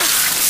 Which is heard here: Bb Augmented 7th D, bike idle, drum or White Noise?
White Noise